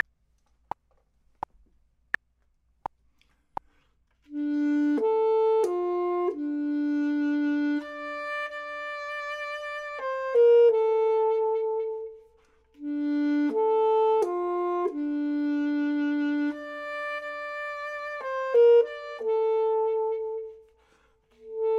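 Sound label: top, Saxophone and Clarinet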